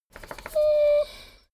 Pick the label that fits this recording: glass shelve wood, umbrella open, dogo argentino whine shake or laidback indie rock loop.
dogo argentino whine shake